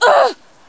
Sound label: FEMALE DEATH; combatarms2